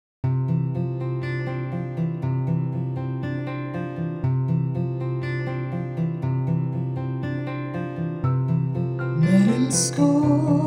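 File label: pop group with vocals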